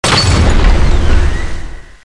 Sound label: holy water explosion, weapons